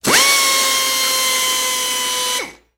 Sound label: Saw, Air Tool, Epidermal, Bone or Skin, Mono; hospital & lab equip